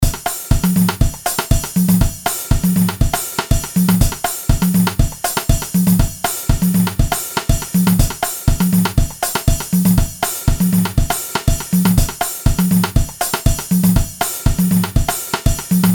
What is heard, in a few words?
latino loop 120 bpm